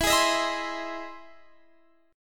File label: E Augmented Major 7th E down Guitar Standard; strummed; E+M7; JGuitar